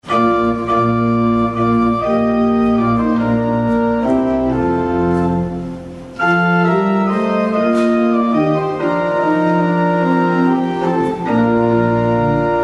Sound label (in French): Orgue Saint-acceul